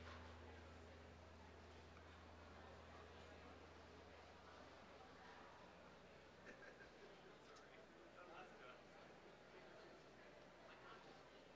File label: Airport Ambience Packing Up; San Francisco International Airport